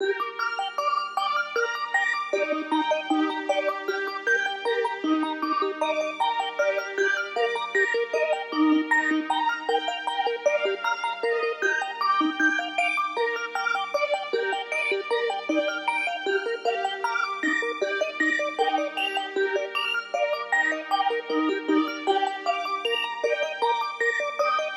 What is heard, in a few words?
155 BPM G Min Arp; Cymatics; Destiny Gold Expansion; Neon